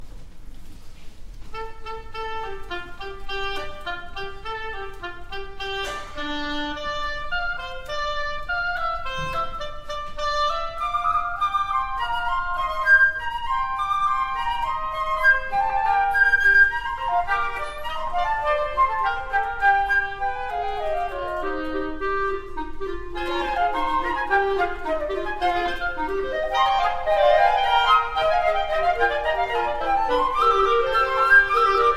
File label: Variations Woodwinds